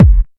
phat kickdrum F sharp key, Royality free steel kick drum sample tuned to the F# note